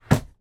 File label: foley flightcase down on table